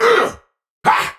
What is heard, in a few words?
Attack Effort; Warframe; Tau; Dax; Ashigaru